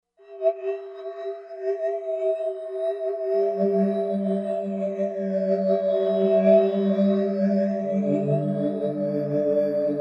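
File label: Pad Vapor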